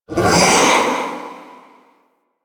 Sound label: Wraith Reaper Vocal Jump; Warframe